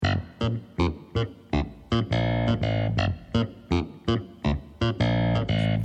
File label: bass; sandokan